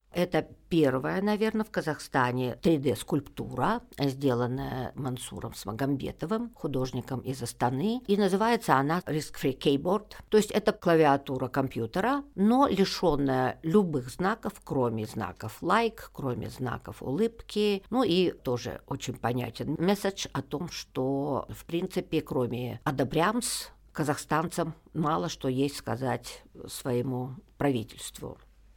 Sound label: keyboard